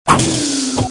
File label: shplunk